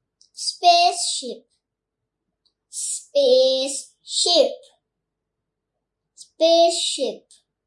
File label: spaceship